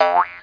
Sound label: spring